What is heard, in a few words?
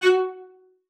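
CELLO spiccato F#4; Xsample VOL-5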